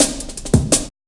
Ruffneck Cut, Drum Loops 165.5; Jungle

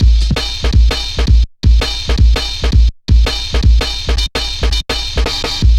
drum loops 165.5; Klanga; Jungle

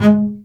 BASS MART G#3; AKAI S-Series CD-ROM Sound Library VOL-2

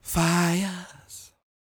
croon, Vocal Planet Blues Soul; Spectrasonics

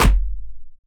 Elektron Monomachine, Drum Machines

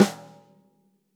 snare snares on; JazzFunkKit

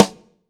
AMB SN, AMBIENCE SNARE

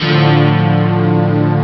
stack; ST-XX Amiga Soundtracker Sample Packs

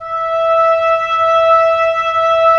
Oboe; Roland - LA Composer vol. 4

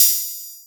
KPR Hat O, Korg KPR77 Drums

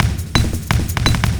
LOOP, Eurotech; Sounds Good

